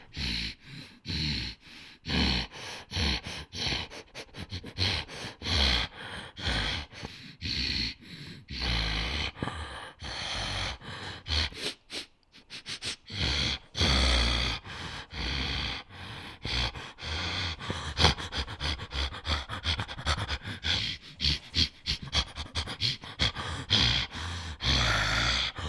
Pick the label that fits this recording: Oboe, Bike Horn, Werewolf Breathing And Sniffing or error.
Werewolf Breathing And Sniffing